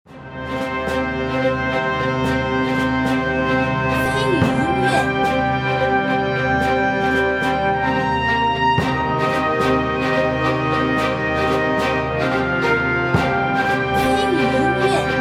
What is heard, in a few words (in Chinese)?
恢宏-气势-行军